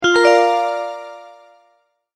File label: multimedia tone start